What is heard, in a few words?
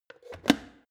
plastic water boiler lid on; soundpool